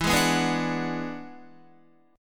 E 9th E down Guitar; E9 strummed; JGuitar

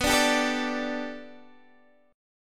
B Minor 7th Sharp 5th, Guitar, Open D

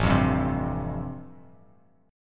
A-Minor 7th Sharp 5th, Bass Standard, chord; Am7#5 strummed; 5,8,5,5; JGuitar

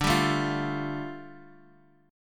A 7th Suspended 4th Sharp 5th, D

